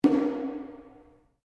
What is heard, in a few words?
DRUM TAIKO SHIME METAL BRACE MF, Small Japanese Taiko drum; Miscellaneous Musical Sound Effects